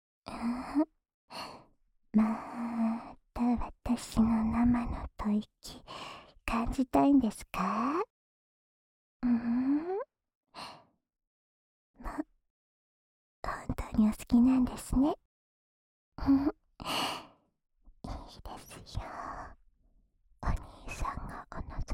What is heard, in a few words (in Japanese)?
安眠用お耳ふーふー（右耳）, ASMR